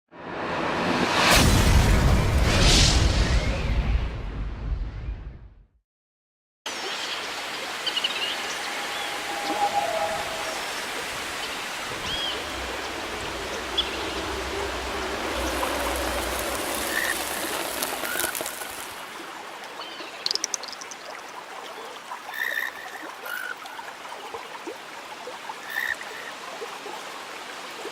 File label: forest